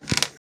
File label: wood squeak; wood metal SFX